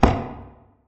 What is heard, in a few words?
footstep; Barotraumatic; xanHuskmutanthunter